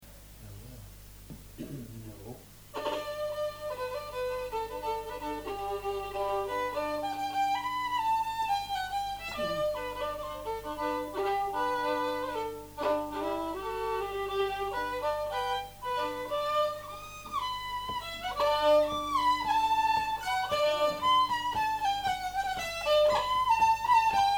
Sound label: polka